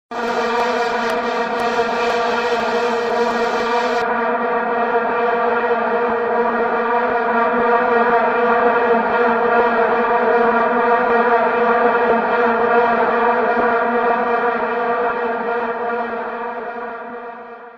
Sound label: vuvuzela fussball stadium